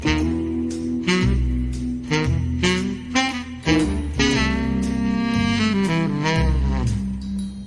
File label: riff